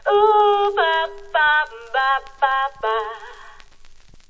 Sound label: 100 bpm Crackle Line; VOCAL OR VOXY LOOPS